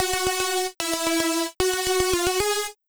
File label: Lead